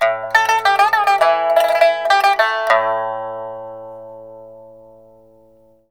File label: PIPA PHRASES; Spectrasonics; Heart of Asia